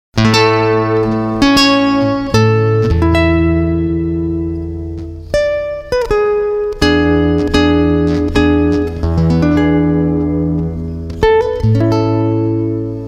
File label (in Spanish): Himno Nacional Argentino en guitarra